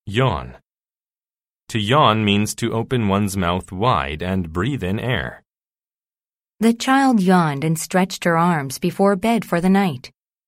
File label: yawn